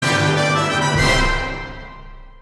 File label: craft success